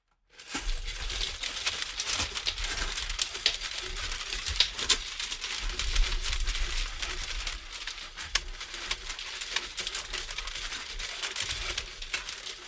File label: machine sounds halt slowly